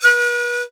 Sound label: PIPE A#3, REED PIPE; Africa; Spectrasonics; Heart of Africa 1